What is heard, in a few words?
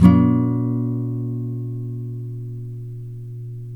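NYLON C MINOR DOWN, CHORDS, Guitars; Spectrasonics; Hans Zimmer Guitars VOL-1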